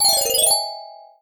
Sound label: reset earcon; john-travoltage